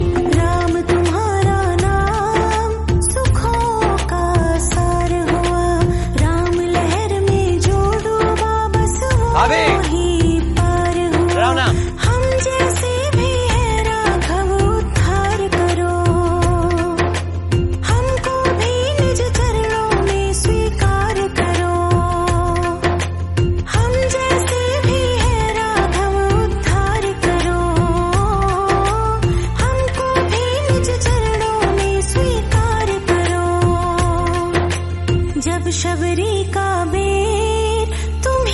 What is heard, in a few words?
Bhakti Hindi Song